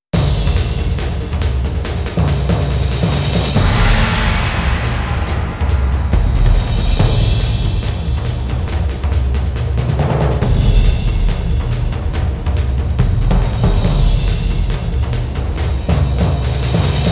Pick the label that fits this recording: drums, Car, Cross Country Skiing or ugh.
drums